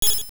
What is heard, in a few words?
Get Rupee; Get a rupee; Zelda Oracle